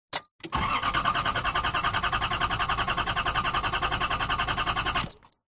vehicles car key turn false start int nissan patrol 1998; zapsplat